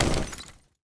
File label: land; Counter-Strike Global Offensive